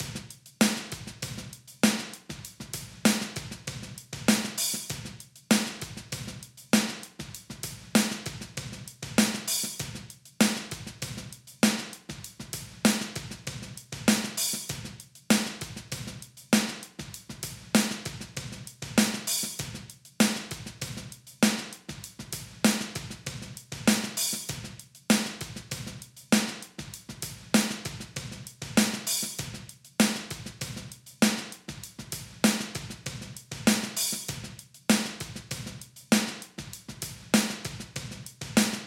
Channel Drums EQ Lows n Highs; Flux Evo

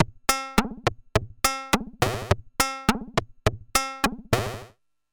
Reon RE501-R Driftbox-R Metallic Percussion

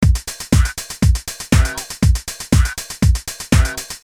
techno

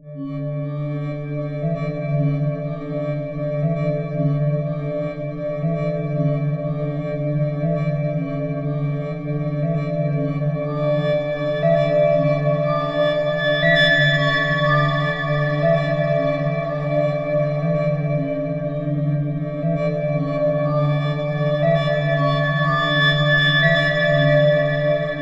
Glas Pad

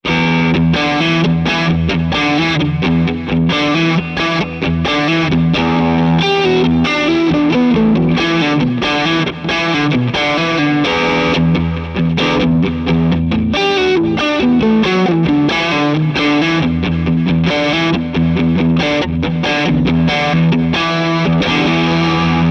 OD2 Lonestar Delay

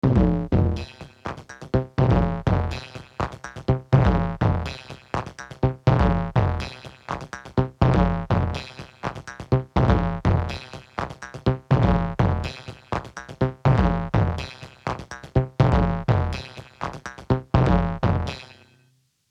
Roland TR 626 Filter FM

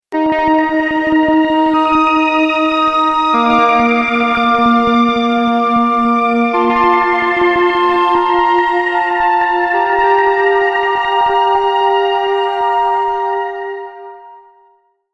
Nice Pad

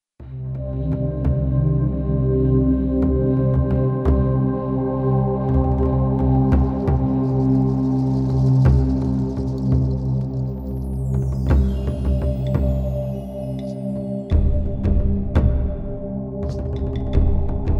performance grooves 85 bpm; forest of wishes

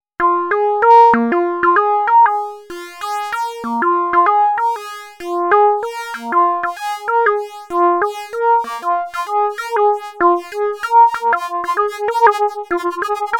VCF Outs

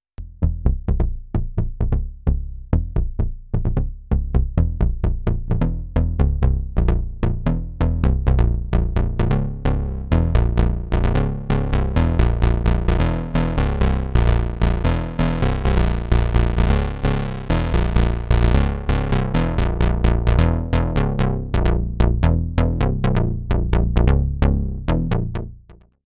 Bass Dry

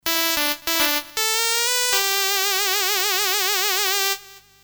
Talkbox tone gain clean; Dunlop MXR M222

